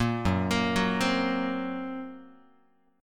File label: Gb Major Flat 5th, down, Guitar; arpeggiated; JGuitar